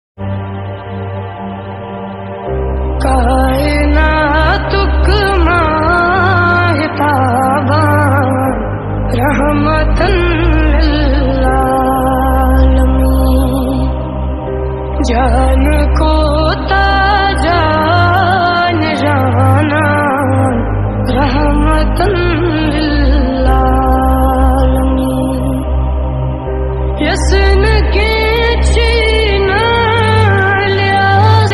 ringtone kashmiri naat new ringtone

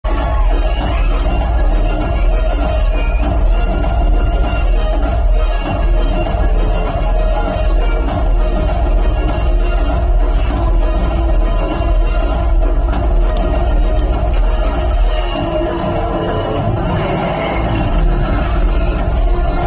entry bgm; mahesh babu; guntur karam

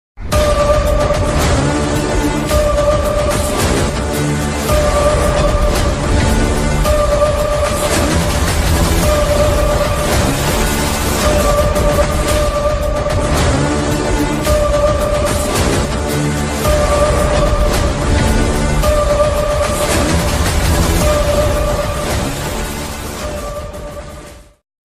love ringtone; lakshya; south movie

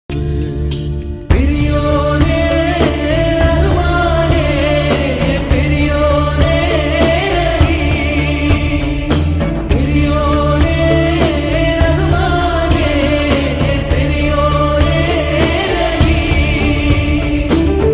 malayalam song; goatlife

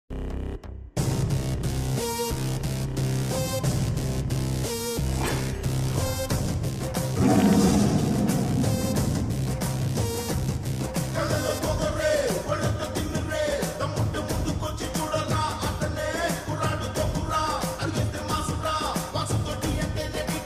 hero entry bgm ringtone; Gully Rowdy

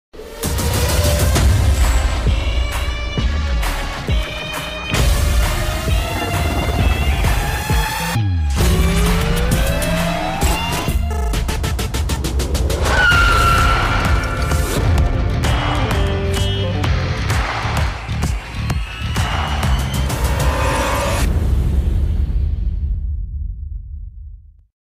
bgm; super over; naveen chandra; chandini chowdary; sudheer varma

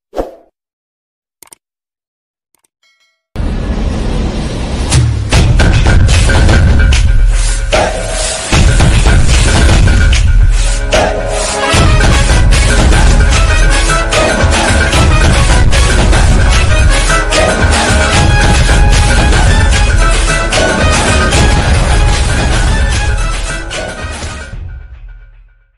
mass bgm ringtone

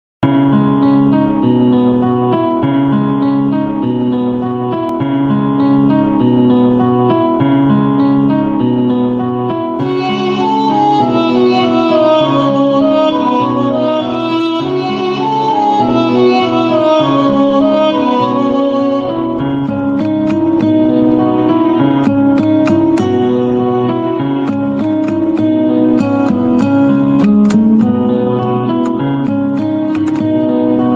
sad bgm telugu bgm ringtones

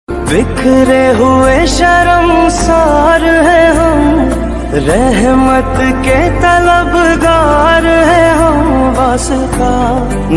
naat sharif ringtone

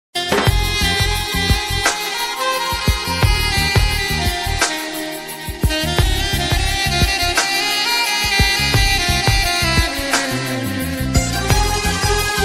love bgm; Gudumba Shankar

